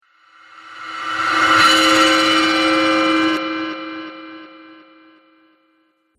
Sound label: Clang Whoosh; sound design